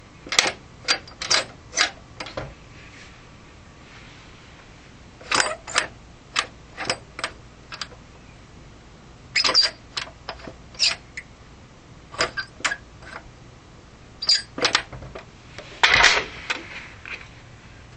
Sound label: Safe